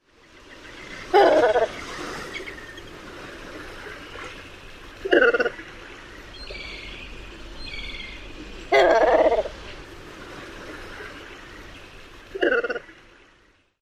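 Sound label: calls of adult, Red-faced Cormorant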